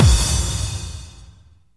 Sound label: FX Impact; Vengeance Samples